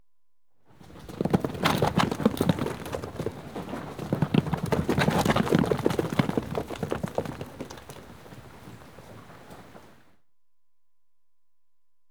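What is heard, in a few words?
Sheep Run Past On Concrete Exterior; Livestock; BBC Sound Effects Library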